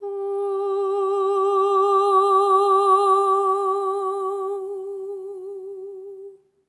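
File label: Soprano Oh G4, Voices; Spectrasonics; Symphony of Voices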